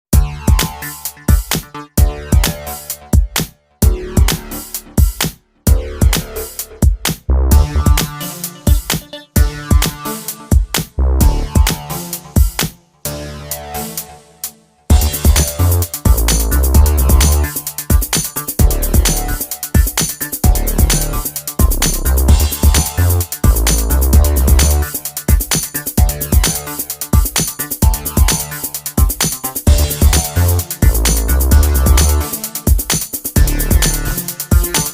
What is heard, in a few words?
race; Ferrari GT Evolution